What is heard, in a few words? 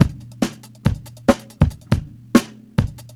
ACOUSTIC, Big Beat Drumloops; USB Soundscan vol.25; AKAI